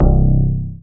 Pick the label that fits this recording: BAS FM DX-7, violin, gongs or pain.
BAS FM DX-7